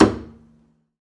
pizz noise slap knuckle, double bass; dsmolken

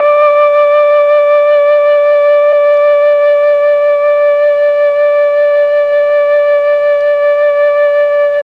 D3 mellotron flute

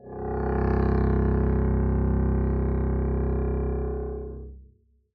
arco c1 f down, double bass; dsmolken

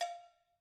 Cowbell Normal; Idiophones; Struck Idiophones; Cowbells

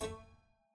Harpsi Lute Rel A2, Harpsichord, English; Zuckermann Kit Harpsi; Chordophones; Releases

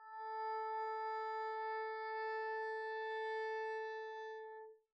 arco a4 pp up, double bass; dsmolken

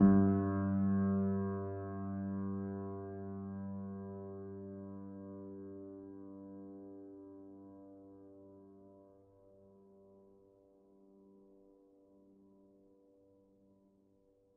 Upright Piano, Sustains, G1; Chordophones; Knight

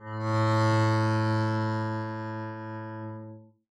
arco a2 mf down, double bass; dsmolken

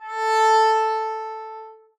arco a4 f down, double bass; dsmolken

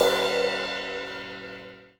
Crash Soft; hydrogen drum samples

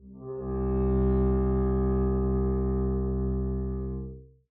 arco c2 mf up, double bass; dsmolken

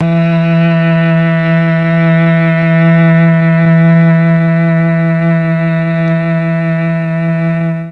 E3 mellotron cello

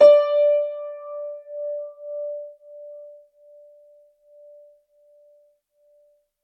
Grand Piano, Kawai, Sustains, D4; Chordophones; Legacy

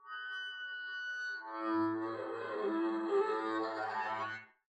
arco noise extra high moaning, double bass; dsmolken; noises

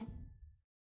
Harpsichord Rel Main A2; Chordophones; Releases; Harpsichord, Unk

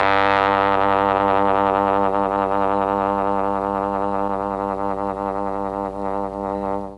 G1 TRMB TRMP, mellotron; TROMB+TRMPT

